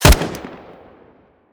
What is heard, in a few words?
aug, weapons; Counter Strike Model Pack; CS2